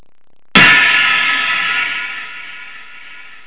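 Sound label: CYMBAL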